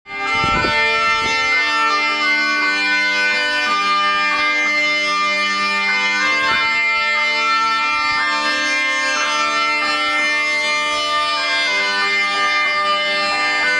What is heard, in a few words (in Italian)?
cornamusa